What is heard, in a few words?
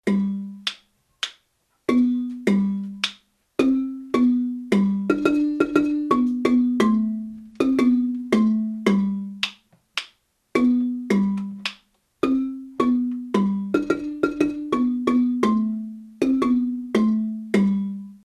baritone response, marimba; kare mugomba